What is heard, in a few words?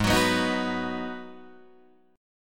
G sharp Minor 7th G sharp, Guitar Open D